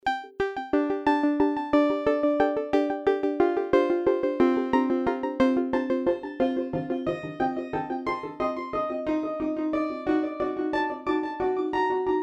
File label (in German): iSEM Grasslands Arp und Lautst Verzerr